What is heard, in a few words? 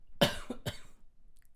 male cough